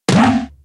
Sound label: Smoke; Se Smoke